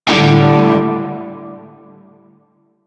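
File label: Shawzin Pentatonic Major Chord H; Music; Warframe; Narmer Shawzin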